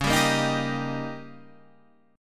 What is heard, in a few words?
D sharp 7th C sharp, guitar